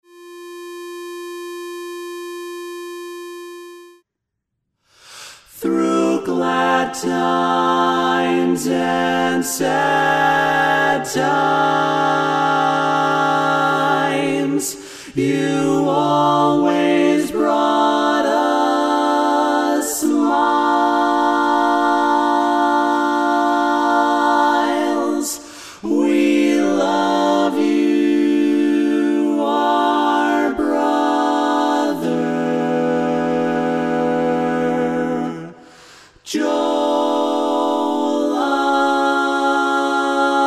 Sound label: SATB BARI LEFT; Tags